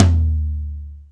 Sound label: low tom studio